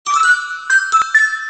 sms gling tones; ringtones

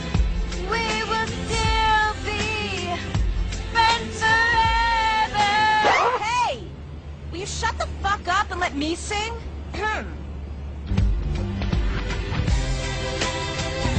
singing

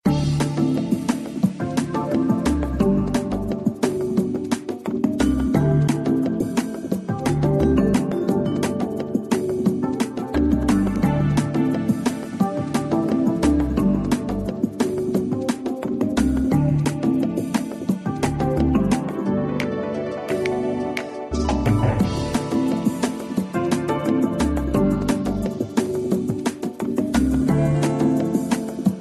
battle, ringtones, games; tetris

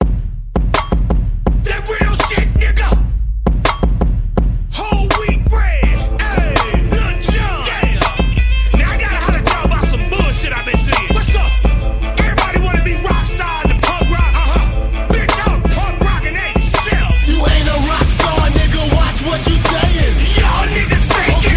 gangsta beat; hip hop

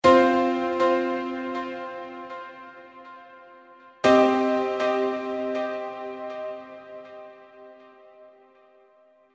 piano

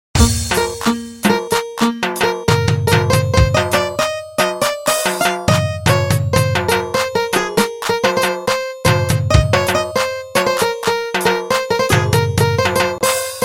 Afghani Music

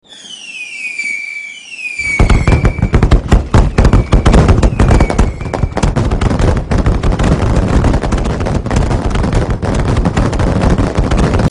3d fireworks; ringtones

